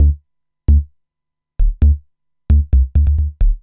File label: bass, dance